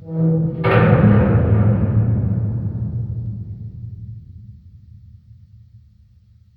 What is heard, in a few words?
Rand Amb